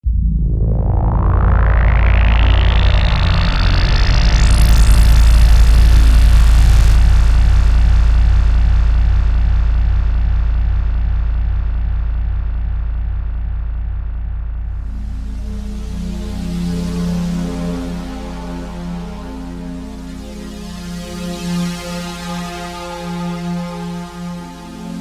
vortex soundwaves

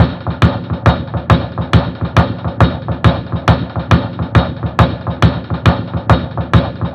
perc loop

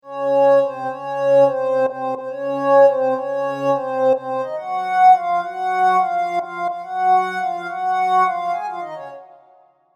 serum synths; chiqui audio

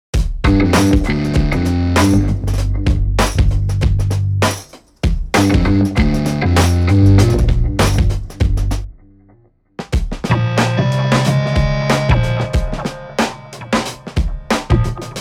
rootbass - keys

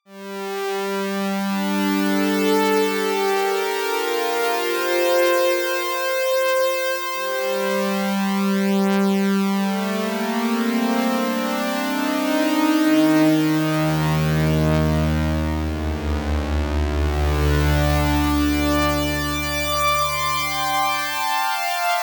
OB classic saw strings; opx pro3

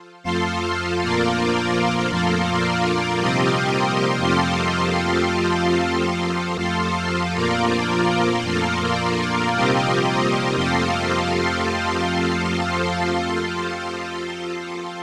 mixed pad; stringer

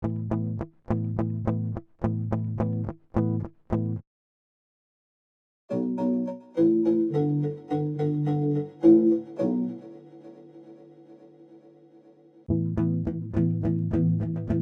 guitar

